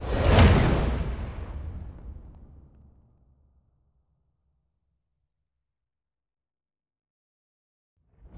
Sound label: science ship move; aquatics